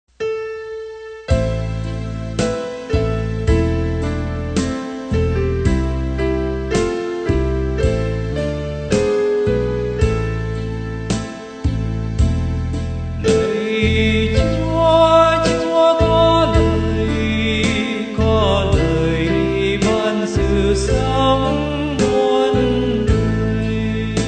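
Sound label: soprano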